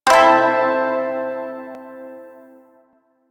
Shawzin Pent Maj Chord I, Music; Chords

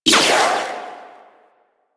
Charged Shot, Auto Crossbow; Warframe; Tenno; Prime Ballistica

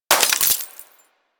Bullet Impact Debris Glass; Warframe; Weapons

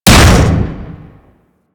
Hammer Impact Default A; Warframe; Weapons

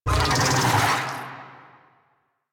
Infested Big Ashen Vocal Attack; Warframe

